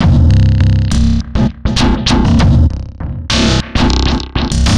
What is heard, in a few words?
drum